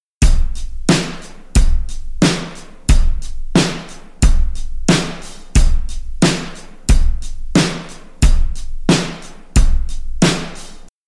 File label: Drum Beat